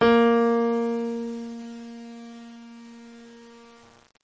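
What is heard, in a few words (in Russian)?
пианино одиночный шумный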